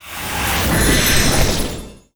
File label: Enemy Iceberg Crystal Attack Motion; disney star smash android ios mobile gamerip 2020